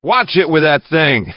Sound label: shot